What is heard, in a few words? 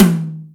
Room Mid, Acoustic Tom; Yamaha DTXpress